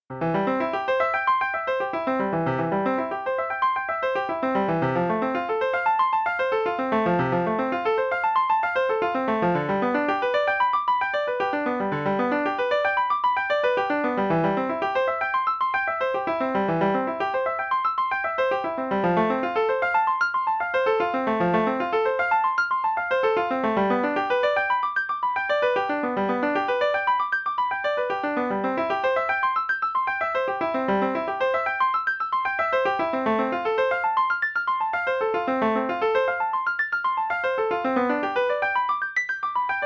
Arpeggio Exercise; (All 3 Positions); Technical Exercises for Improving Keyboard Technique

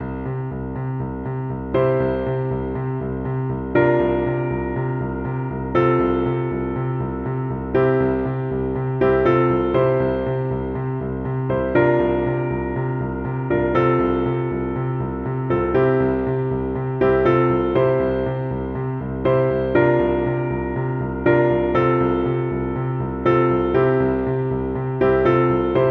Exercise in Rhythmic 'Pushes'

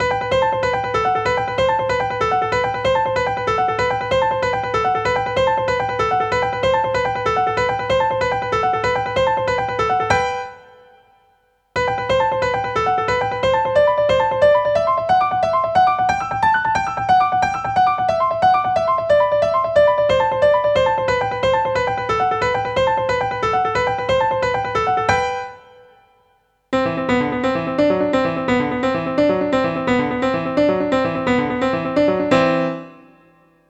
Exercise in Broken 6ths

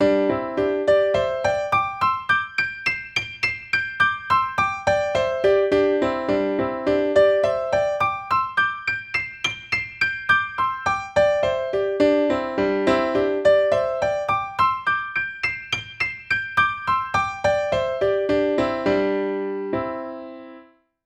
Right Hand Double-note Exercise - Hunting Horns

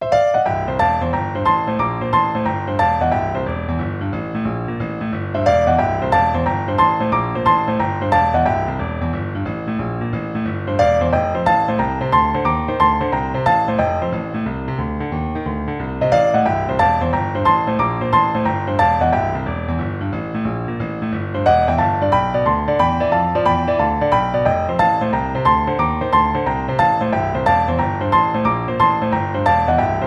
Boogie-Woogie Exercise

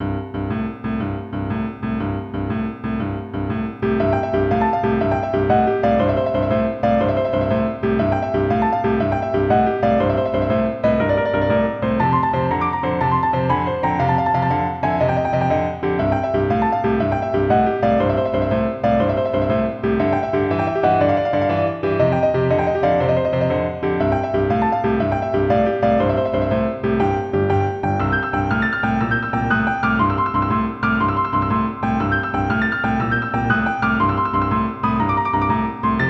Exercise in Flips & Tremolos in 3rds

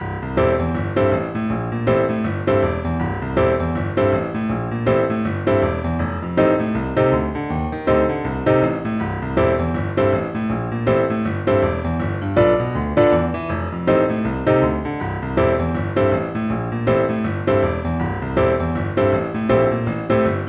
Boogie-woogie Rhythm Exercise in Broken Octaves

